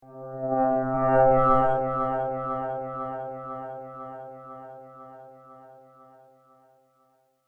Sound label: Cello In Space, sci fi music; science fiction sound effect